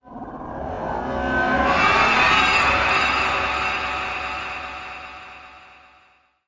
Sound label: Space Buzz Ring